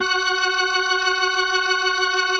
B3, First Call Vintage Keyboards

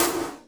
CR 78 KIT; GM KIT

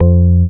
DM ORG1, Dance Mega Synth

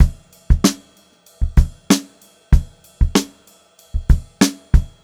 Funk-Groove Drumloops; USB Soundscan vol.38; AKAI

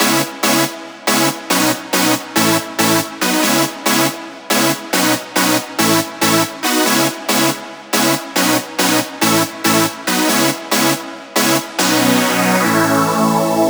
140BPM C#; Vengeance - Essential Clubsounds Vol.4

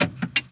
on off, Switch FX; Masterbits

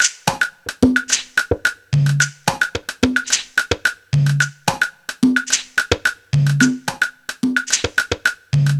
Conga Clave, Percussion Loops

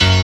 SYN, dance; Sound & Vision - Gigapack II

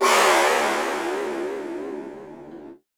Flanged, Synth FX; musicradar; cartoon caper

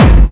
TOM ELECT

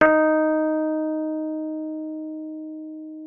MKFF D#4, RHODES; Xsample VOL-1

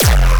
bass hit almost a kick drum F; Virtual Riot

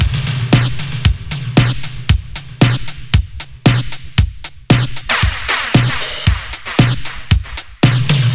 115 Beat Lost; Zero-G; Future Wave; Beats

